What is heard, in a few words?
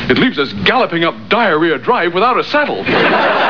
gallop; reddwarf